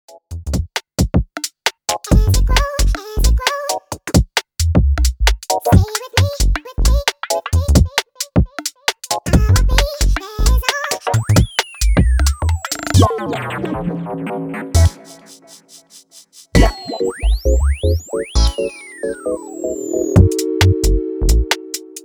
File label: SCRATCH